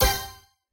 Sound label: scatter short; spinsane